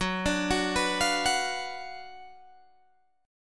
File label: Gb Major 7th Suspended 4th Sharp 5th, Guitar Open G